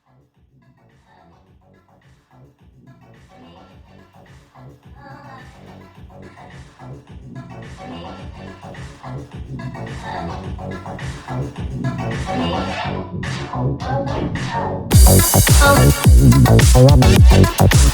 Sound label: The Siren Funk; Pony Music Archive